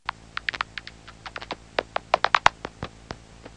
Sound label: bat